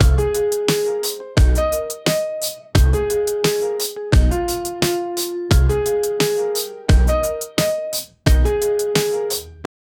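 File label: old timey song sample piano, 87bpm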